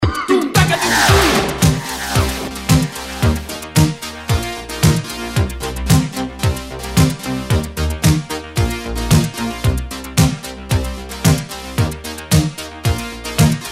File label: disco